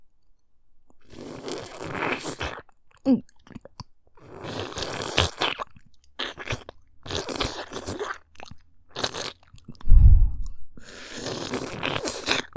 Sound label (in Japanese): フェラ音(じゅる...等吸う音)